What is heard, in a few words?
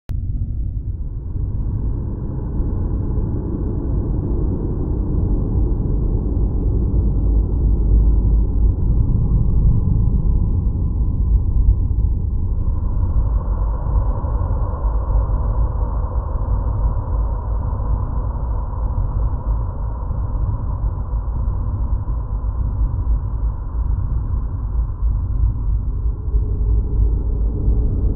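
ambient atmosphere, music; ArmedAndDangerous; Wrath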